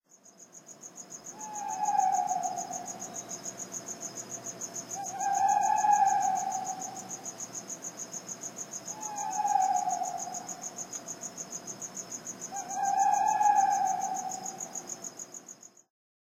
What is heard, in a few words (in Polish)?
sowa